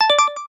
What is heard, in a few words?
success